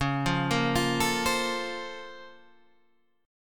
Bb Minor Major 9th Db

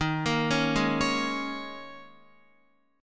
Eb 7th Suspended 4th, Guitar; Eb7sus4; arpeggiated; x,6,8,6,8,8; JGuitar

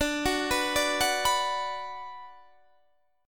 F sharp Suspended 4th Sharp 5th D

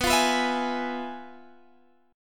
F Minor Major 7th Flat 5th, B

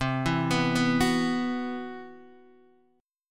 C Major 7th Flat 5th C, Guitar DADGAD

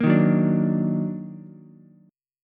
D sharp Minor Major 7th, Ukulele; strummed

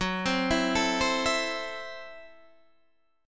Gb Minor 7th Flat 5th Gb Guitar DADGAD

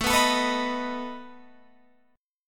A Suspended 2nd Flat 5th A down Guitar Open F; Asus2b5 strummed

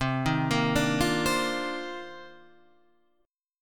C Minor 11th C Guitar Standard; arpeggiated; JGuitar

